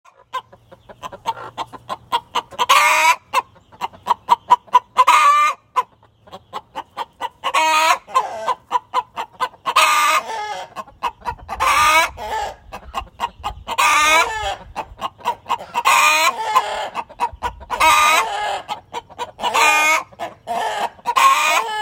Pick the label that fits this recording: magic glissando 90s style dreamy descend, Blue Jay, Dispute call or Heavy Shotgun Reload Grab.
Dispute call